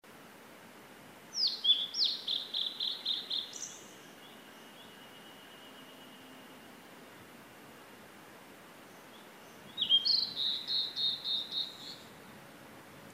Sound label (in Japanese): ooruri